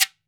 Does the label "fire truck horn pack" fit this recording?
No